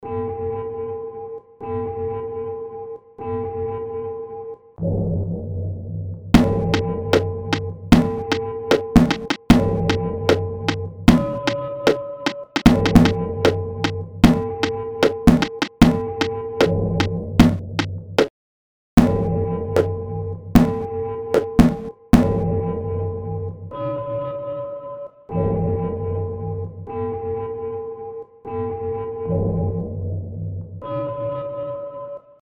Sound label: Waves